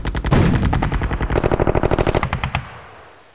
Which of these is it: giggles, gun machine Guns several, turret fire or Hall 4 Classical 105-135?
gun machine Guns several